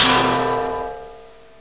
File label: clanger; ambience